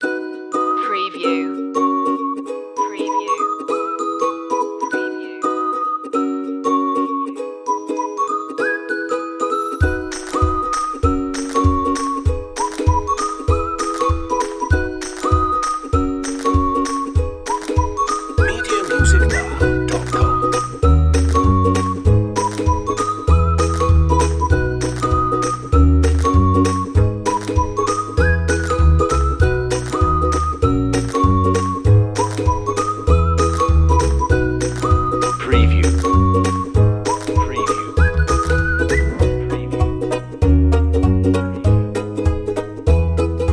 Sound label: happy folk